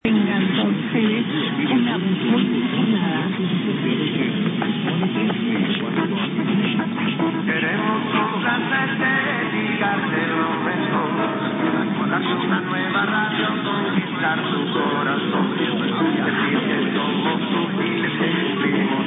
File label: JINGLE; Radio Acuarela